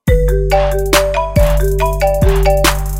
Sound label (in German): iPhone Trap SMS Ton